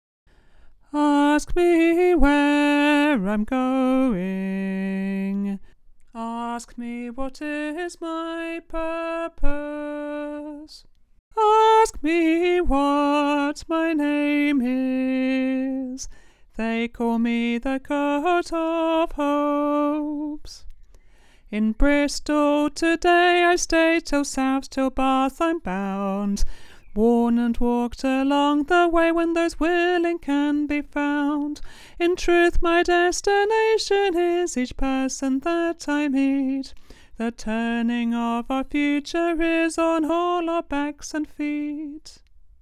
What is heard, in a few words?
alto